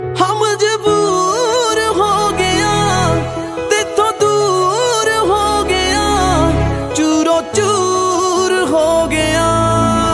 Sad Punjabi Song Ringtone; ringtones

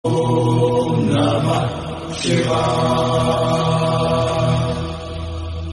Om Namah Shivay SMS Ringtone; ringtones

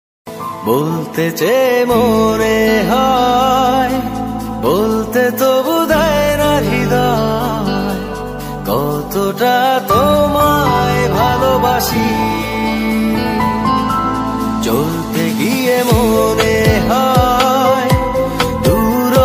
New Bengali Romantic Ringtone; ringtones

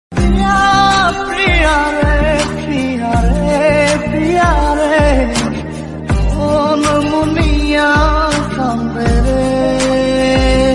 Bengali Song Ringtone

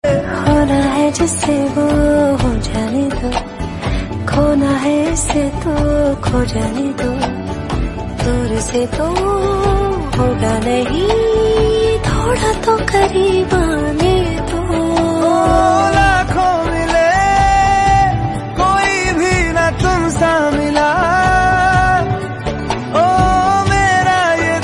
Female Song Ringtone

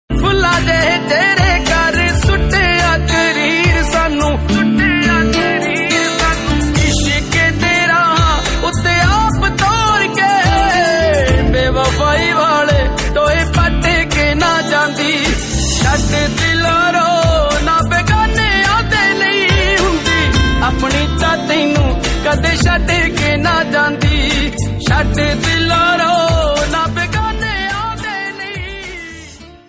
Sad Punjabi Song Ringtone

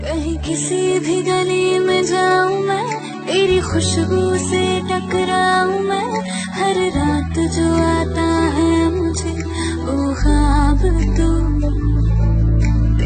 Female Ringtone

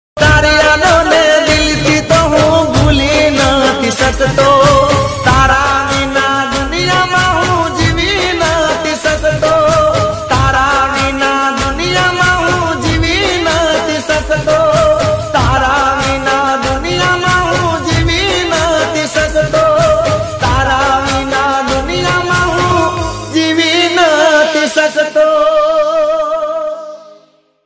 Sad Ringtone

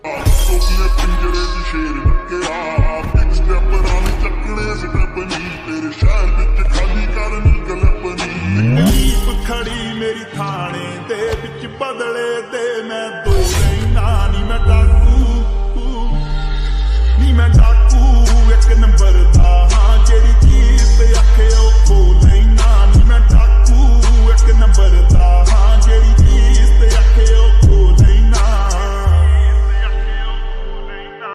Remix Attitude Song Ringtone

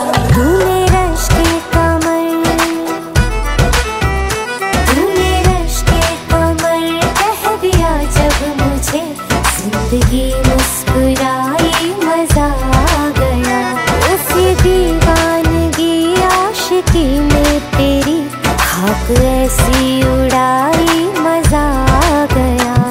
Ringtone Female Version

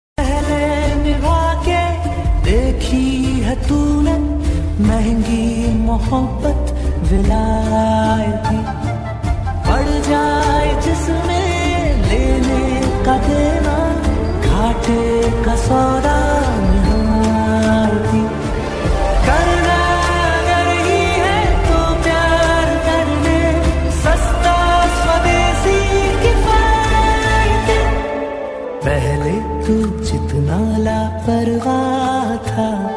Hindi Song Ringtone

